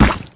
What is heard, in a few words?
limbs, flood